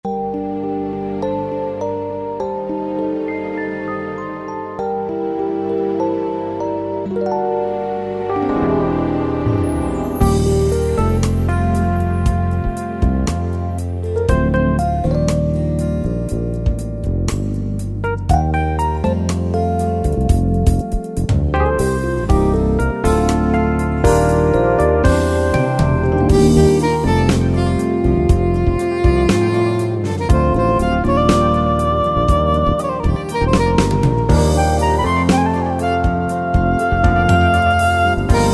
Ballad, Yamaha PSR-3000; Factory Demos

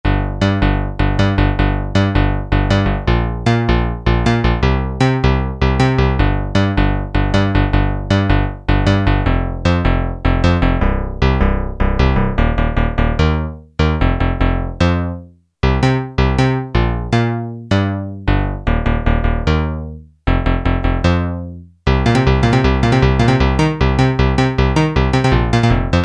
PPG BASS; Akai SG01v

